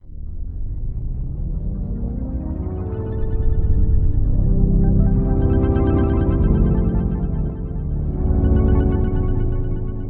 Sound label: Underground, Soundfont; Onj's Soundfont